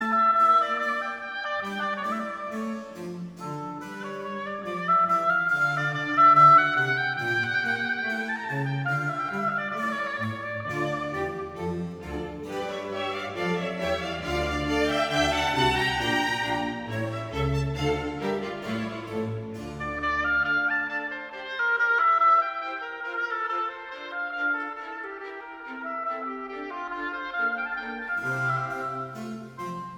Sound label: Andante e spiccato in D minor; d-moll; Marcello Alessandro